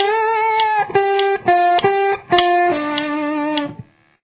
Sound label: outro